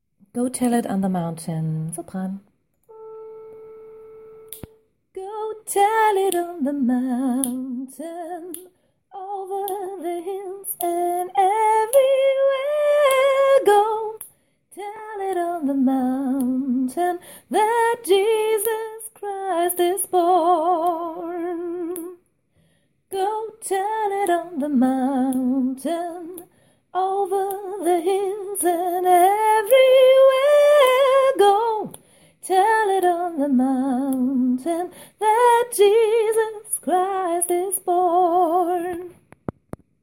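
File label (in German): Go tell it on the mountain sopran, gospel choir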